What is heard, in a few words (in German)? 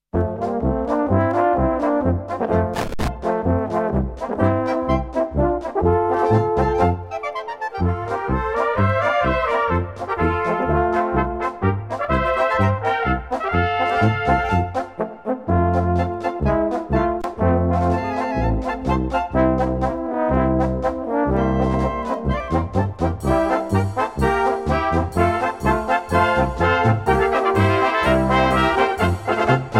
Polka Aus Strani